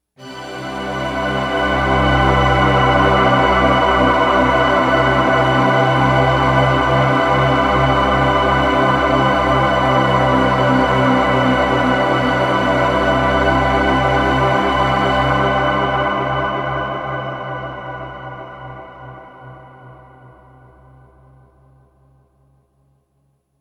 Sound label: poly pad e1005